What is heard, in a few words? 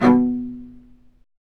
STR, Low Marcato; Roland L-CD702; Combos 1